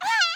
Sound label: pain short; teefault; ddnet